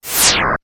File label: Boss Tail whip; LTTP; Boss whipping its tail (Helmasaur King; also Agahnim's lightning strike, Beamos firing a laser, and Ganon in Bat form